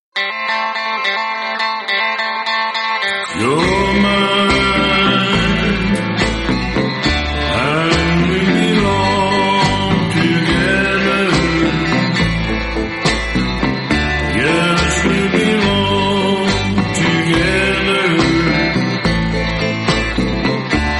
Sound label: Vocals